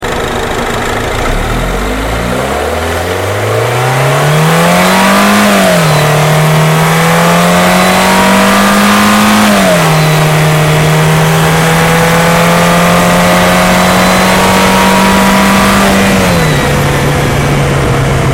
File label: Mini Countryman Hard Acceleration Outside